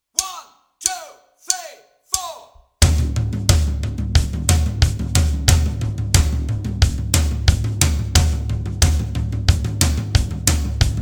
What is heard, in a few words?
Solo 90BPM